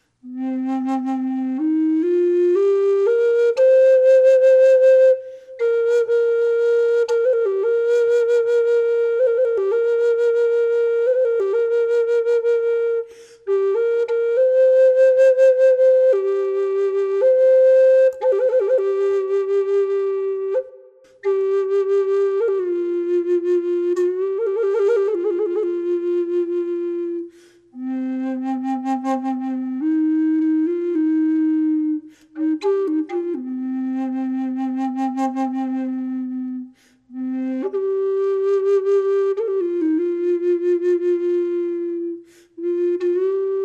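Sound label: reverb, flute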